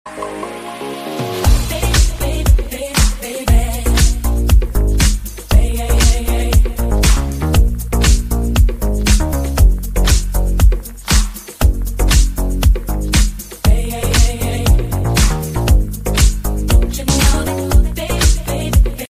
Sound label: samsung soft